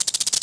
insects, ambient savanna; World of Tanks Blitz

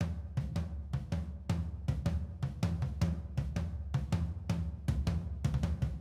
music interactive; World of Tanks

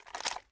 ui drop gun; Rust

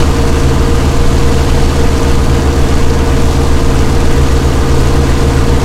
bearcat cockpit, engines; War Thunder

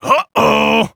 vce uhoh fall

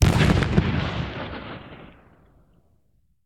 aa explosion; War Thunder

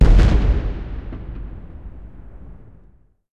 aa explosion far; War Thunder

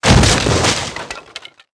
wood box mid; World of Tanks Blitz

